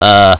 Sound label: "uh"; beavis and butt head